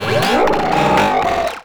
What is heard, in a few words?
Talking Machines; Warframe; Corpus; Railjack